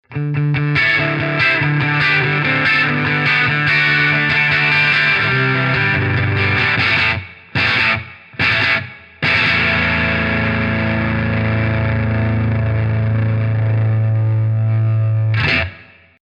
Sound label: stadium stack; logic pro; amp designer